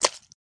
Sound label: mud; Mindustry